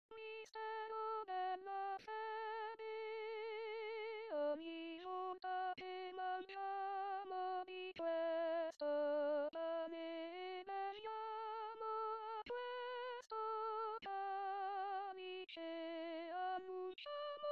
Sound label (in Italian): Mistero Della Fede Tenori; anno liturgico